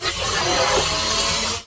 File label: Drone Deploy Throw; Titanfall 2